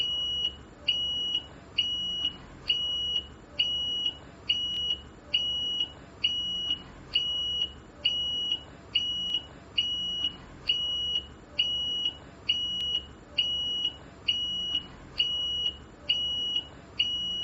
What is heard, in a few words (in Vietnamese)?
tieng xi nhan xe keu